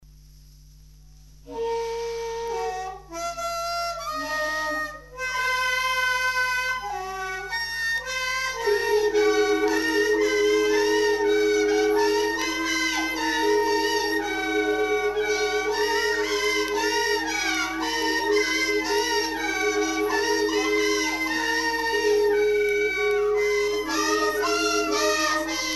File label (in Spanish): CARNAVAL HUAYNO. Huayno; festival luzmila patiño; fundacion patiño